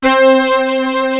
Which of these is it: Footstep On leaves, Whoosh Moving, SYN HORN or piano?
SYN HORN